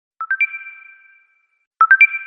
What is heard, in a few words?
Whatsapp Message